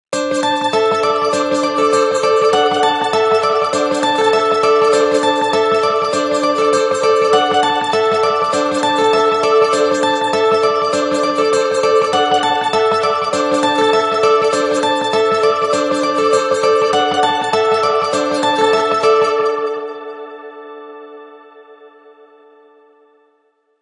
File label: guitar